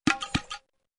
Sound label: drum